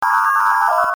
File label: transporter standby signal; sound ideas